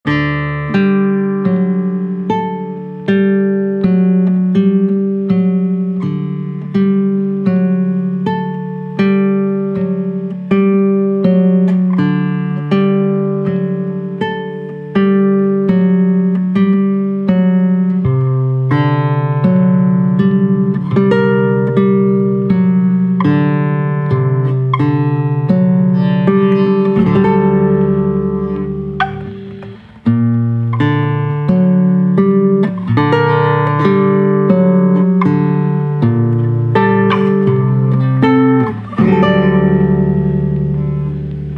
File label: Berceuse Ac Guit